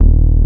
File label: BASS, Drum & Bass, 160 BPM; Ueberschall; Drum & Bass Resonance